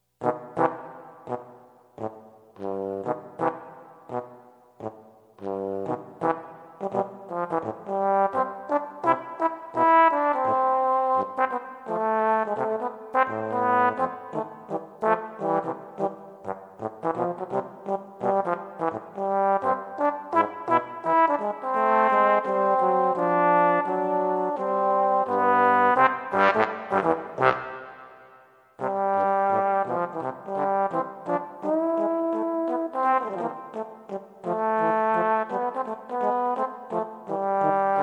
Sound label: Two Tbns; Battle Hymn